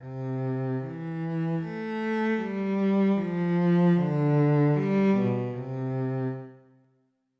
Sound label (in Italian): Altezza e Dinamica